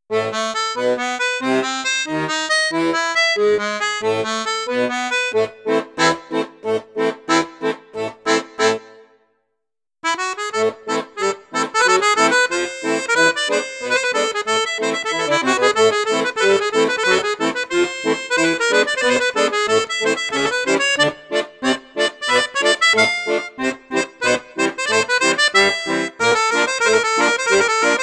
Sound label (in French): registre flute juste, accordéon; mengascini f11